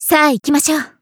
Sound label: applause